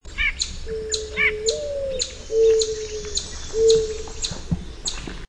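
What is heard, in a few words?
Mourning Dove coo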